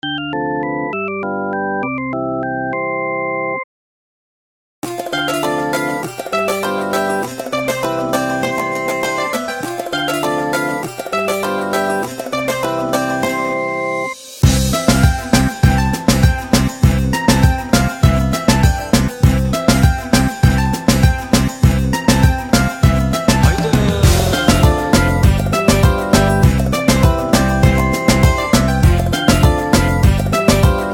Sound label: Turkish Nokia